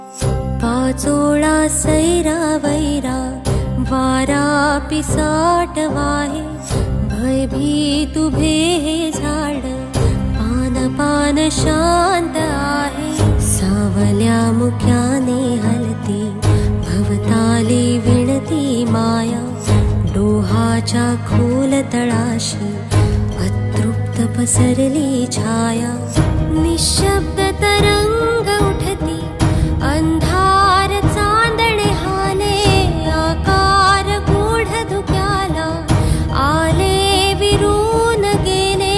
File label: Marathi Serial Ringtone; Ratris Khel Chale